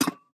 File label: Peg Leg Footstep